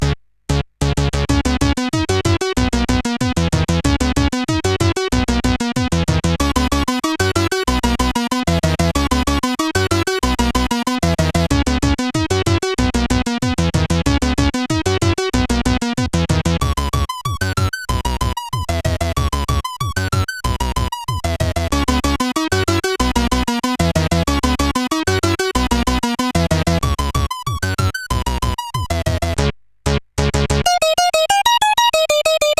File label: Dance, sid CSG8580R5; Creo